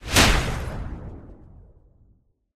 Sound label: boom; jewels